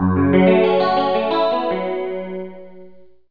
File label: chorus